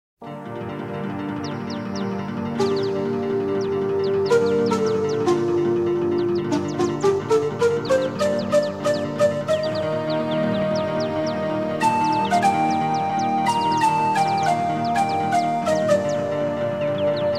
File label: zither romantic